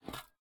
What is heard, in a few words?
step, copper golem weathered; Minecraft